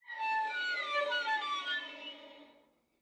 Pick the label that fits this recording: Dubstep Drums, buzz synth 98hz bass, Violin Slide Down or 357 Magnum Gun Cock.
Violin Slide Down